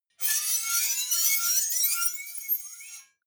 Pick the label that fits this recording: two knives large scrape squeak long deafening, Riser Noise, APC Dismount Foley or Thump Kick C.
two knives large scrape squeak long deafening